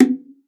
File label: Bongos; ProSamples vol.42 - Session Instruments